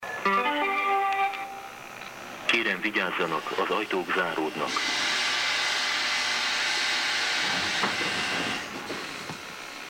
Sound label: Budapest metro